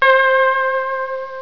FAD TONE

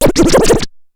SCRATCH

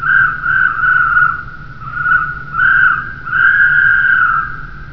WHISTLES

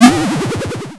PLASH IT

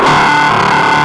SLOW DRAW

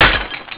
BOTTLE, GLASS

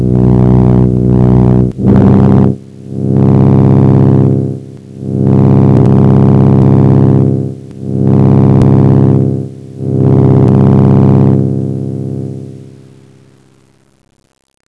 propelle

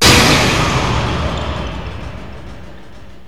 ABYSS

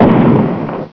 RIFLE

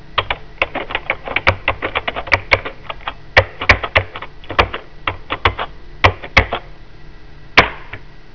TYPING, COMPUTER

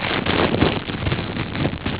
PLASTIC, KITCHEN

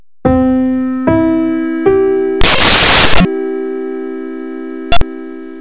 C MAJOR

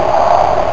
MISS

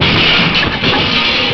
crash; WalkerII

